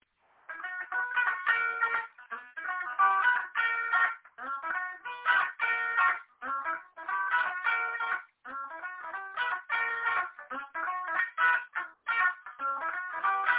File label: offbeat 6 chords and tune